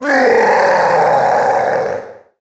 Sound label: Bowser Roar; Galaxy